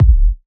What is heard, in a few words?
Kick; M8; Electronisounds